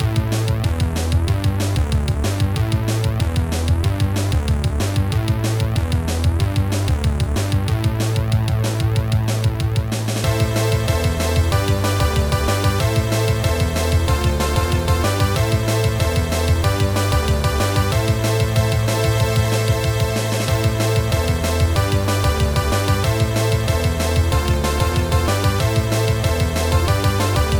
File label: moving beat; Trackers; Modland